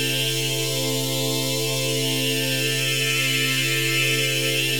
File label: Pad Loop C, shimmer and sparkle; Pad Loops